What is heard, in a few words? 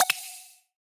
tap stop